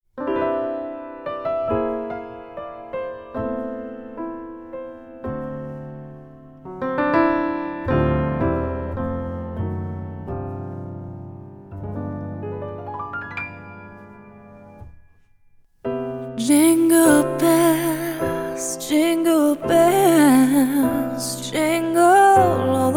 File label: jazz version; jingle bells